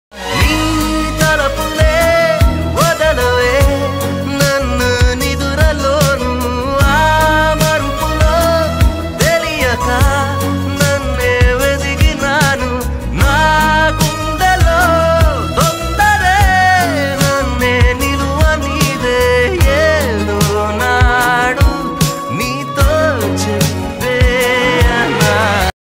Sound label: telugu ringtone